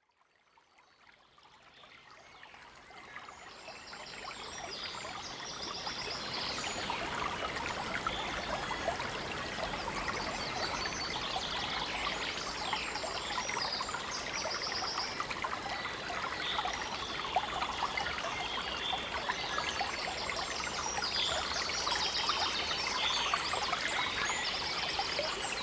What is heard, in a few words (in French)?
eau oiseaux